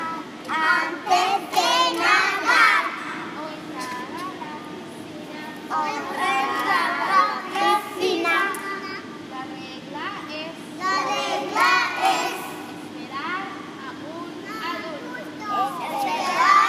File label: Spanish Song